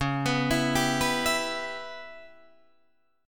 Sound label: C sharp Minor 7th Flat 5th C sharp Guitar Drop D